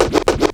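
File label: WILD SCRATCH; Perc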